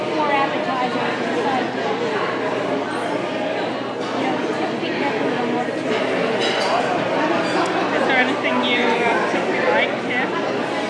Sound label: ambience China grill enjoy the silence in the background